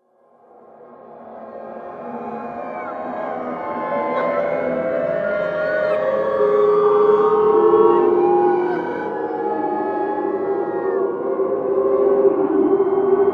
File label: Purgatory Soundscape, Scary Soundscape Sound Effects